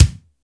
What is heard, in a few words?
TV Kik; Roland MV8800; DAANZ KICKS